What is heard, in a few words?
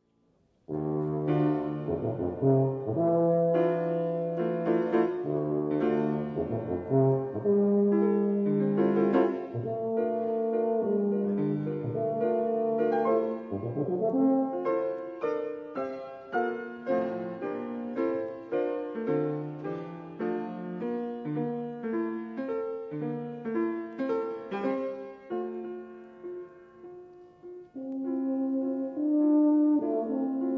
Tuba Piano